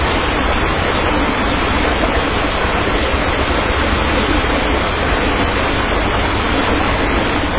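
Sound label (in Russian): водопад шумный близкий звонкий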